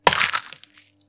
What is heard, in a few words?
Egg Fall